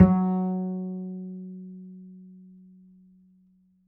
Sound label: mf G, G string upright bass; Upright Acoustic Bass